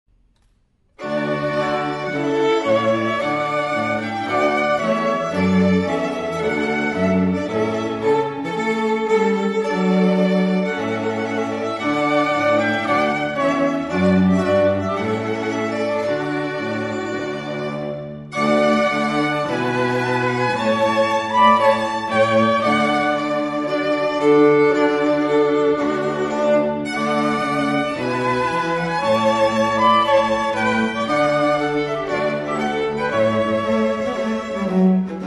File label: trumpet voluntary, string quartet